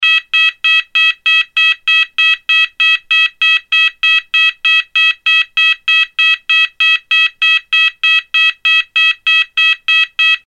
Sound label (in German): Ton